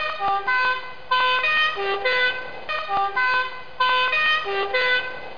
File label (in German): Polizei